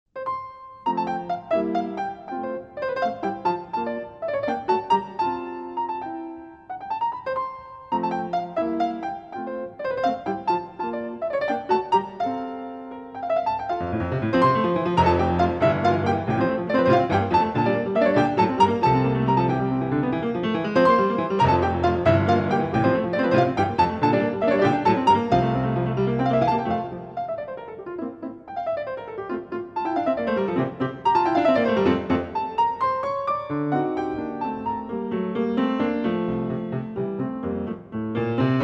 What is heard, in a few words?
sonata f dur kv 497, piano; mozart; 3rd part